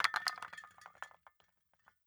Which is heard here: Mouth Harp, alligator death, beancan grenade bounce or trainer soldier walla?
beancan grenade bounce